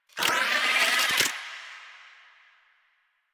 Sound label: Cave Hi Tech Clicks; Ambience; Warframe; Stalker Cave